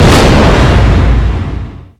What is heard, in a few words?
wind explode